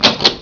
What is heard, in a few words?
open door; svencoop; sence; source of life